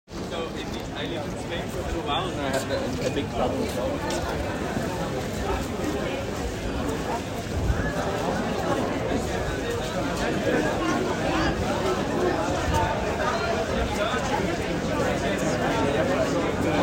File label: bars and talking people, Rotterdam Field Recordings